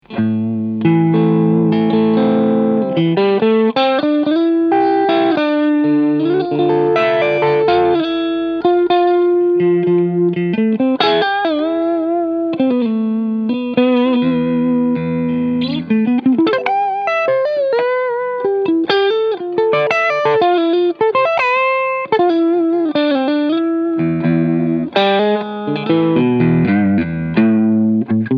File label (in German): Keeley Noble Screamer Overdrive beide Schalter unten Drive 12 und Tone 3 Uhr